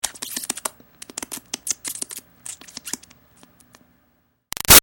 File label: Mouse Squeaks Mouth Nibbles Cartoon; Miscellaneous Animal Sound Effects; Big Room Sound